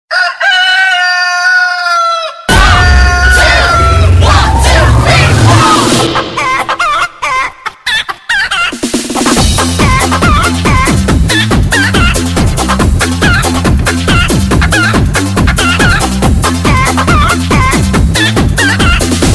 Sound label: Insane Chicken Mix